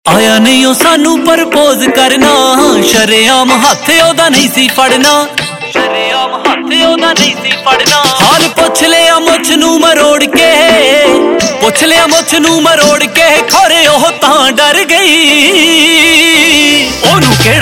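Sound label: Ringtone, Punjabi Pop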